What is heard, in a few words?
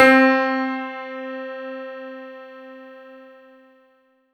C4, synth; 90 s Sample CDs; NiceBeats; SynthLine VOL-23